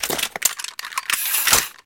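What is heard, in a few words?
installing underbarrel cartridges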